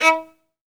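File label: solo violin, strings; ILIO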